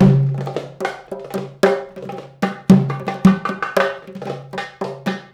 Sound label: PERC LP, Arabian Traditions